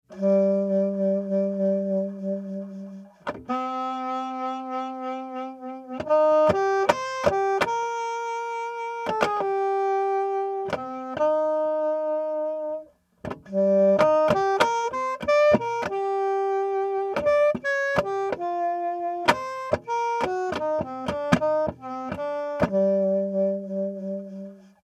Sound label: Sax One Mic; Manufacturer Audio